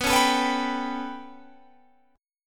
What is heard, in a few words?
B 7th Suspended 2nd Sharp 5th down Guitar Standard, chord; B7sus2#5 strummed; JGuitar